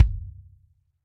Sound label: KICK Gen Purpose burried plastic